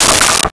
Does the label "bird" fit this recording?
No